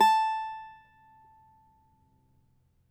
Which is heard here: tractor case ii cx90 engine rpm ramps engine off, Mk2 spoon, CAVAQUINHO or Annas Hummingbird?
CAVAQUINHO